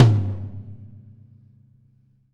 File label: R B Rack Tom B Close; Sonic Foundry (Sony Creative Software) - Drums from the Big Room The Mixes; R&B One Shots